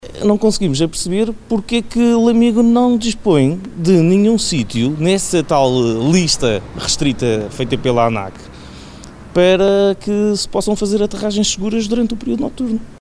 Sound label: heli